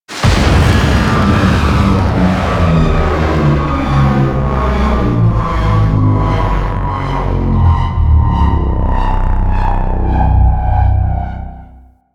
Capital Ship Death; Warframe; Corpus

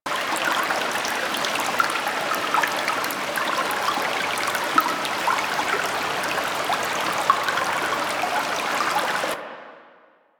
Camp Stream Loop A; Warframe; Quests; TheNewWar; Ambience; Drifter Camp

